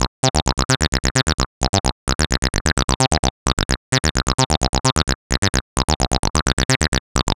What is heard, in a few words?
130 Cm bass, bass loops, trance; Trance Explosion Vol1